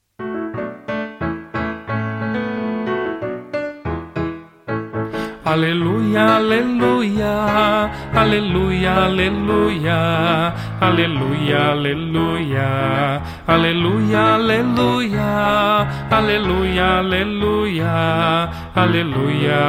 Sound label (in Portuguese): aleluia aclamacao; festa do batismo do senhor 2021 ano b; aleluia pois abriram-se os ceus